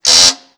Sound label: Klaxon, train; OpenBVE